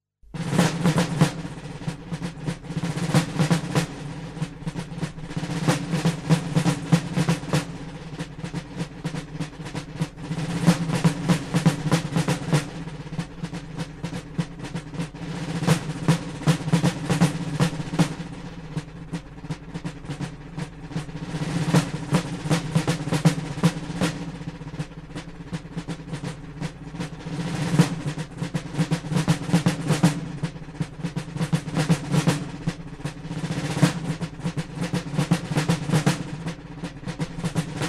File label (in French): Reveil Bivouac; Napoleon; hymnes Napoleon